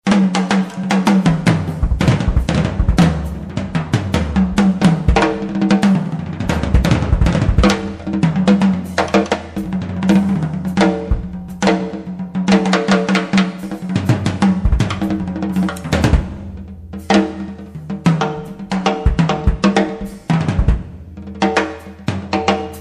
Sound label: solo drums